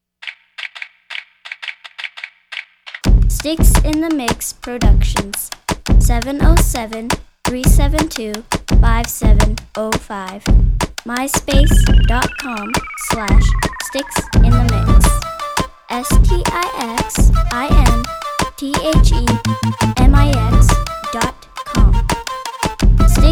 turkish bounce, beat

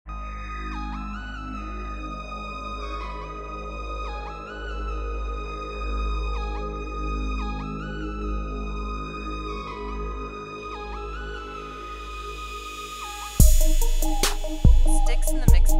Distant trap

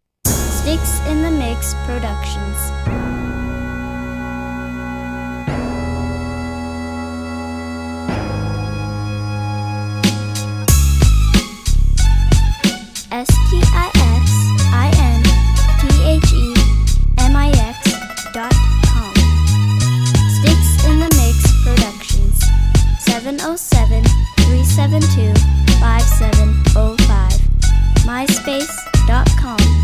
bagpipes, beats

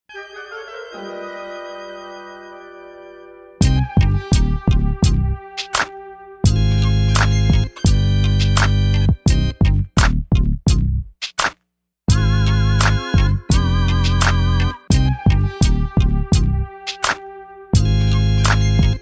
organ

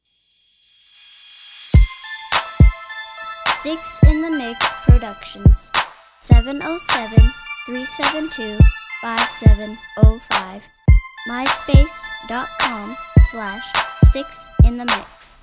eighties, beat

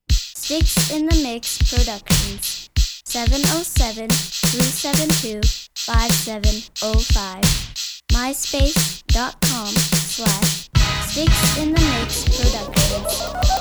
slick crunk

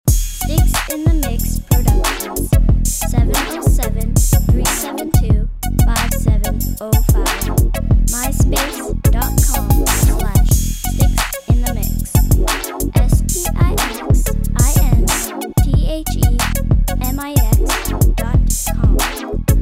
gangsta boogie

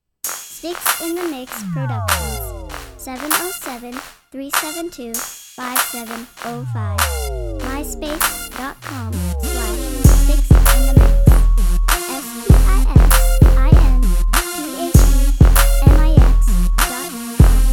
crunk

